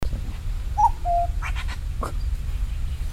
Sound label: cuckoo common Cuculus canorus